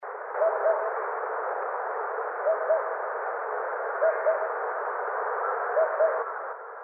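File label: tutudori, Oriental cuckoo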